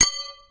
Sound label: ting TING